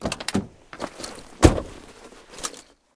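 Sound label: rustling and grinding of an opening door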